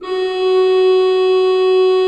HGRP F3, Cathedral Organ, HOLZGEDACKT; Propeller Island

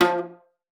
F3, VIOLA PIZZ; Xsample VOL-5; 90 s Sample CDs

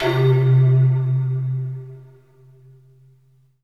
SQ1, Synthesizers

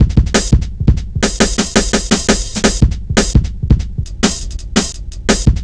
170, drum loops, drum & bass

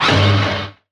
Misc Stab

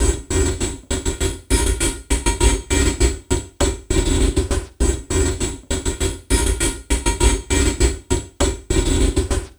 Envelope Beat; Drums & Drones

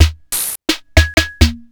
Seeburg Select-A-Rhythm

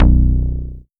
FUNK BASS; USB Soundscan vol.12; AKAI; Bass Analog & Synth

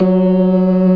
ORGAN, NO PERC; Keith Emerson Signature Series; C3

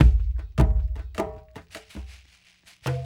Dumbek Grid, Drums; Sonic Foundry (Sony Creative Software); Friendly Fire; Marc Steve

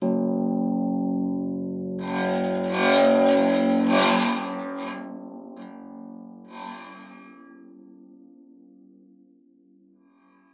PEDX F1, HARP; Xsample VOL-8; HARP+FX

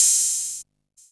Hat, Drum & Bass

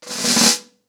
Snare Cres, drums singles; The Roots Drumloops

